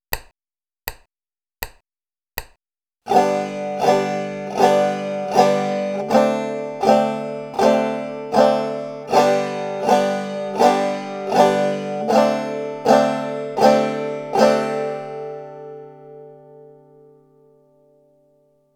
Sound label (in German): akkordwechsel g und d7